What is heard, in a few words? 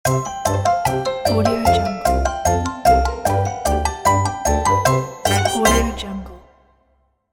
happy fun cartoon finale